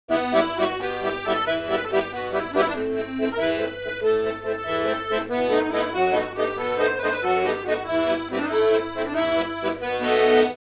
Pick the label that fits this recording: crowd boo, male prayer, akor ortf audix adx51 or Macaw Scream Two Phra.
akor ortf audix adx51